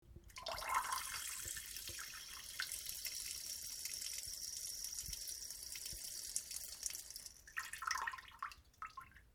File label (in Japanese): ちょぽしょーおしっこ